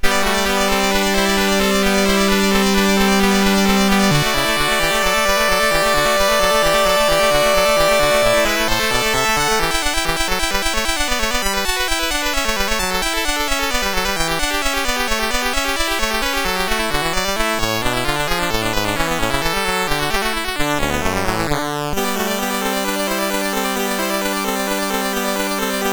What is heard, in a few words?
Prelude and Fugue, MOS6581R2; WTC Mono; WTC Bk2-Prelude and Fugue No15